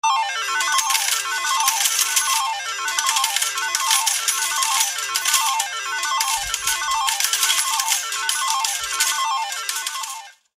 light, feel-good pop song. The soft background music